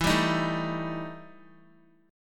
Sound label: E Minor Major 7th Double Flat 5th, Guitar; strummed; JGuitar